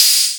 Open Hihats, Cymbals; Vengeance Samples

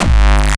BD Distortion, Bassdrums; Vengeance Samples

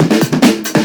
Loops Fills; Vengeance Samples

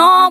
Vocal Oneshots; Vengeance Samples; Vocals

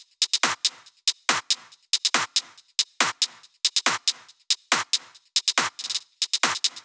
140BPM Lectrotrance Kit; Vengeance Samples

